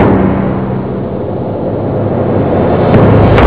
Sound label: perc rev; haunted; jazz jackrabbit 2